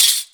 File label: metal; Ragga & Dub Reggae; USB Soundscan vol.27; AKAI